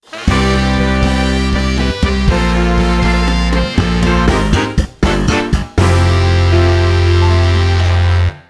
End Jingle; Mario Golf: World Tour

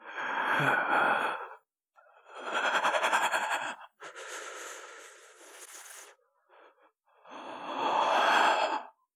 Breathe

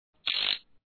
Sound Electric; meeblings gamerip

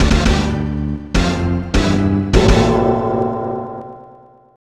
Battle Completed; King of the Monsters 2; SNES

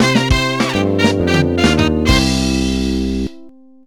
Win; Foto Frenzy Spot the Difference 2009

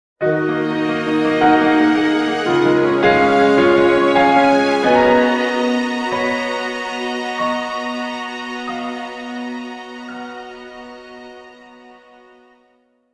Unit Death Jingle; fire emblem musou fuuka setsugetsu original soundtrack 2023

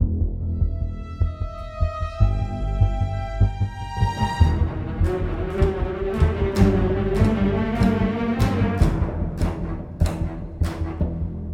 String; Assassin's Creed Unity Dead Kings

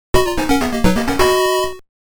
1-Up Jingle; game gear; halley wars

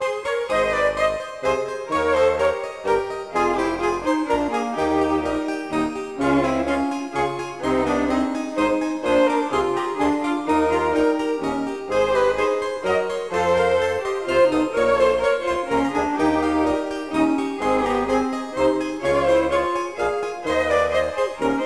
Minuet; toonstruck

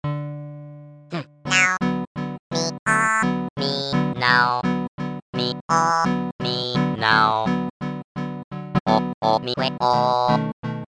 Jazz, LIVE; animal crossing full gamerip

